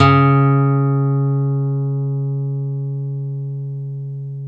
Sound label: NYLON F C#2, GUITARS; Synclavier Sampler Library